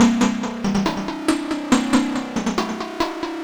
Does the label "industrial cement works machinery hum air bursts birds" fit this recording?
No